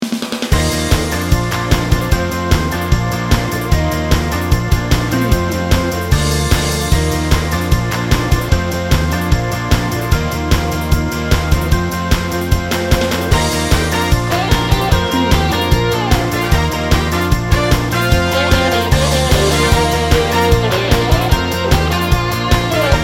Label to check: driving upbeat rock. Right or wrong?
Right